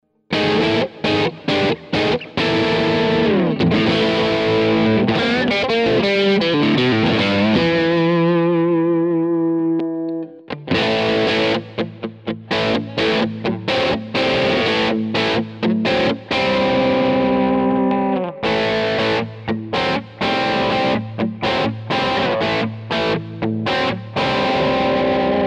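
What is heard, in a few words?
Neck to Bridge Pickup Crunch, guitar; Schecter C-1 FR-S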